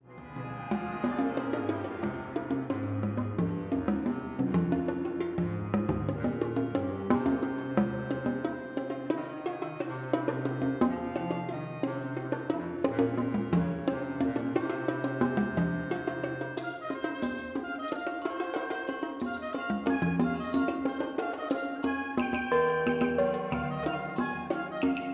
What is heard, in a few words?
Orchestra